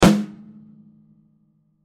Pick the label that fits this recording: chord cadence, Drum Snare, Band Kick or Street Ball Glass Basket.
Drum Snare